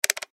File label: Button Switch